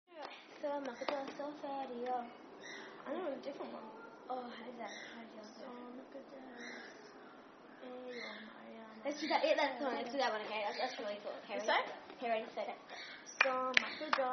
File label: Clapping